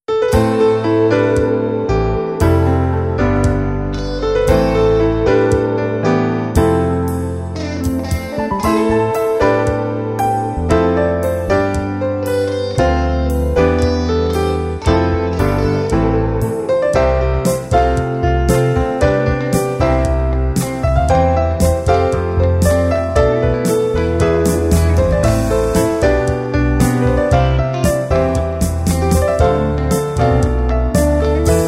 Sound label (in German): Piano lacheln